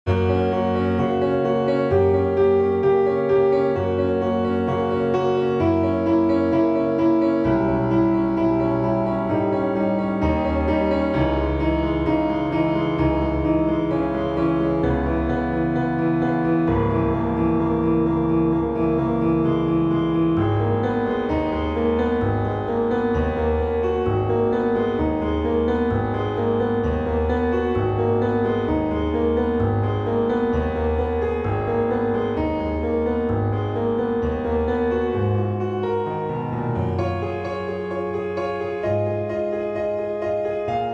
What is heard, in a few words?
uneasy piano